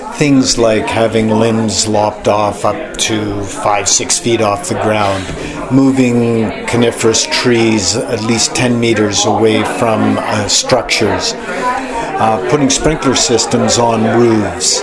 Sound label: Tom